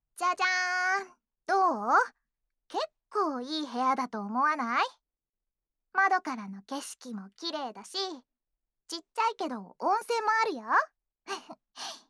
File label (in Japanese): お耳ふーふー; ASMR; お耳ふーふーしちゃおっと♪